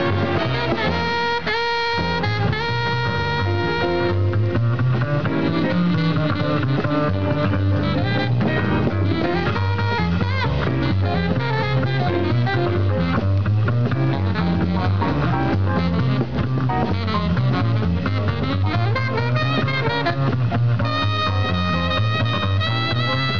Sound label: sax solo